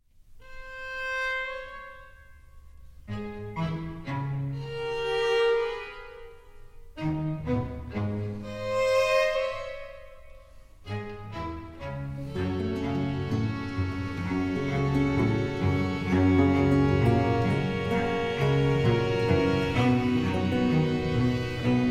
concerto for cello in a minor largo

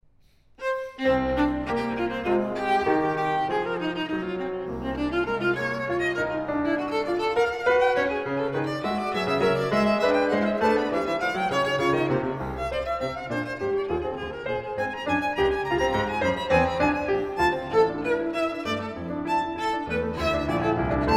sonata for viola and piano allegro

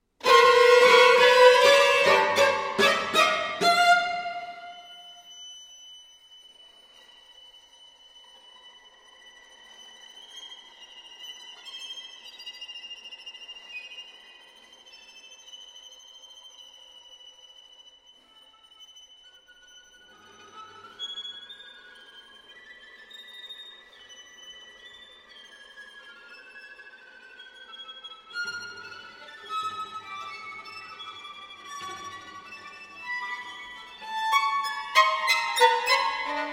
string quartet; Armenian Music Project; Armenian music new and rediscovered

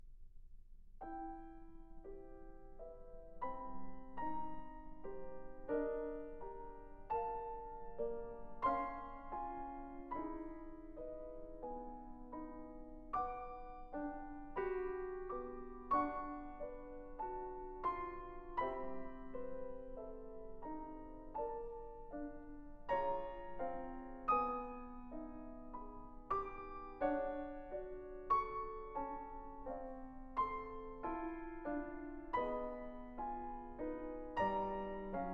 etudes pour piano arc en ciel

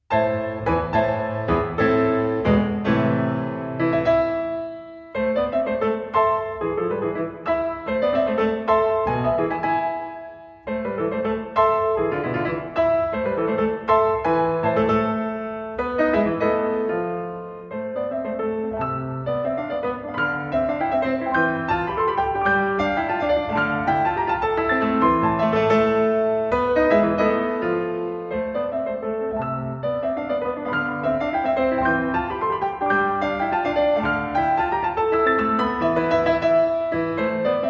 piano; paganini; piano hero

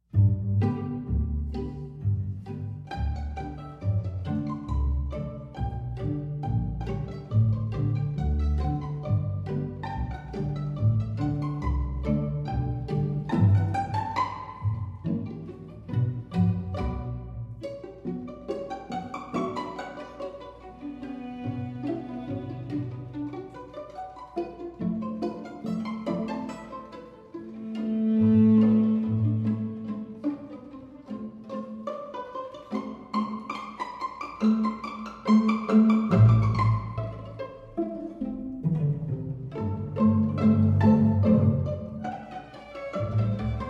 gavotte

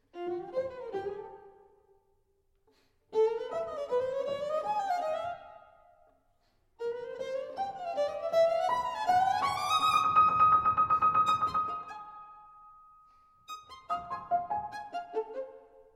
sonata for violin and piano scherzo finale

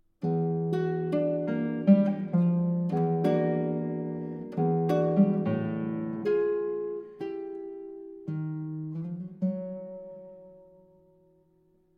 music for guitar

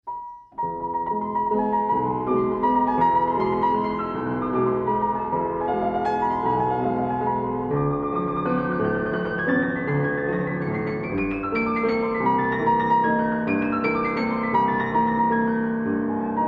microwaltz; Another and Still Stranger World

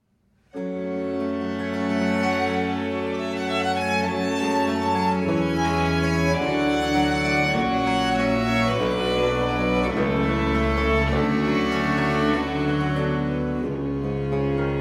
sonata in e minor; sonate da camera 1670; sonata a5 8

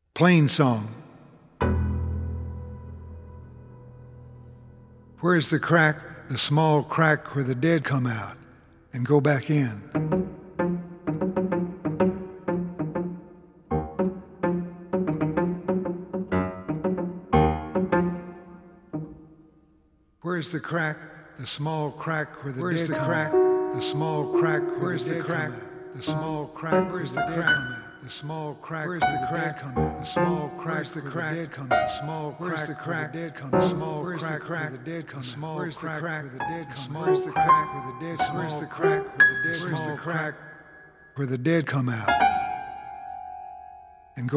plain song

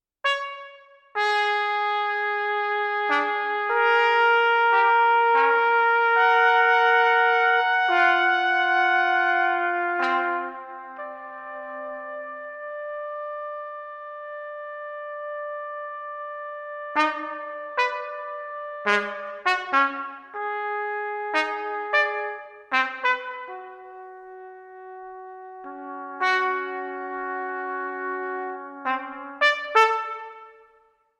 fanfare, horn; for Diebenkorn; Andy Kozar; works for multiple trumpets

